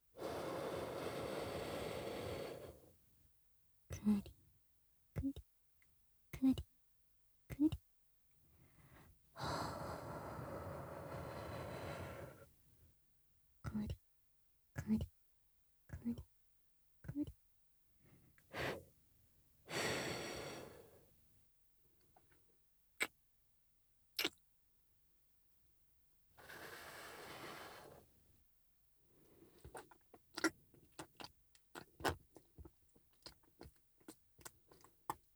乳首息吹きかけ用; 乳首弄りループトラック; 乳首開発

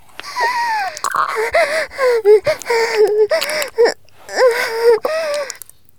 絶頂 中出し; 絶頂+潮吹き+中出し ランダム再生

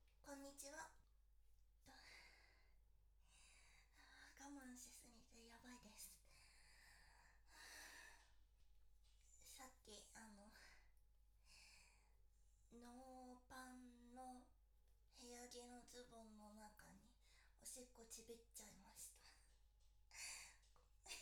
オホ声, 放尿, バイノーラルマイク 収録; お風呂でクリ吸引＆Ｇスポット振動するオモチャで気持ちよすぎてオホ声でまくり！; 実演音声

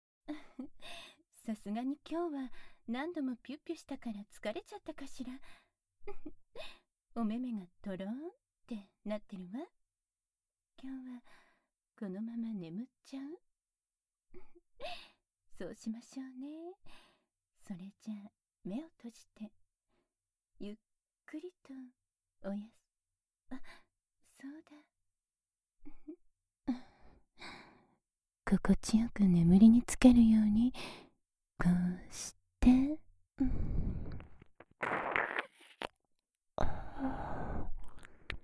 耳舐め, ASMR; 未亡人エルフのねっとり耳舐め手コキ♪

